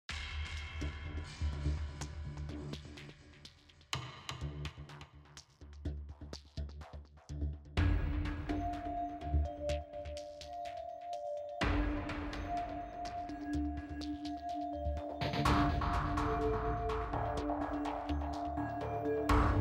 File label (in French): musique enquete; Séminaire d'entreprise original et décalé